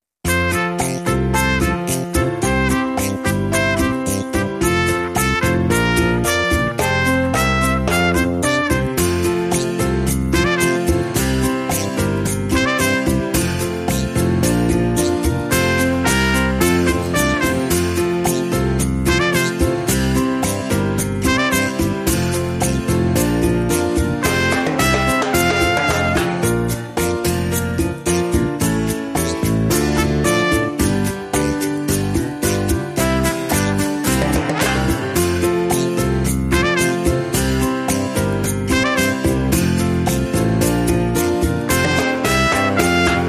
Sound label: childrens tv